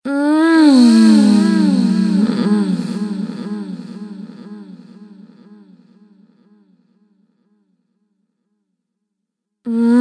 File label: Sex Groaning Woman With Delay Collection